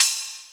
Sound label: HH, Dance Mega Drums; Best Service; HH-HIP